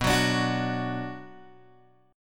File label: B Minor B Guitar Open G